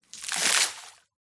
nature water buckets worth throw onto surface of deep water splash